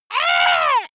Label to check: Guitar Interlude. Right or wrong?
Wrong